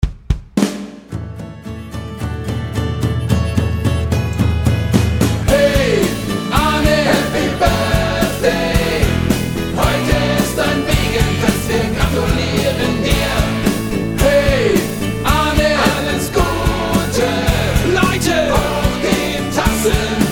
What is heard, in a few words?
Happy Birthday Ane